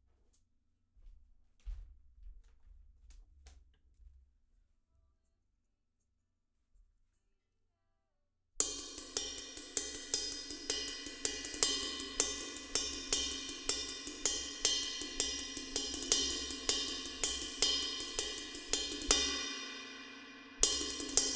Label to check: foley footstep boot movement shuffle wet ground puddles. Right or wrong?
Wrong